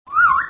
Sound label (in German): pfeife